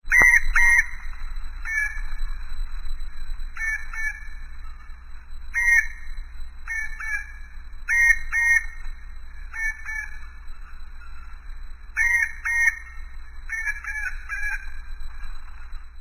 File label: bird call